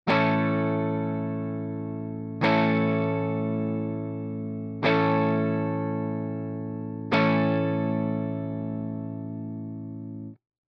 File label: Celestion Greenback